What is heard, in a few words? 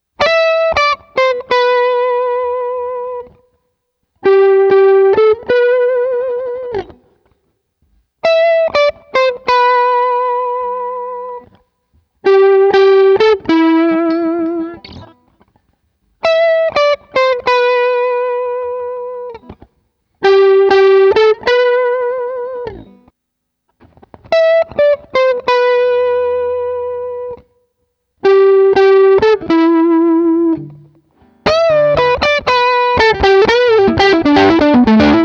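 Guitar Lead; GTR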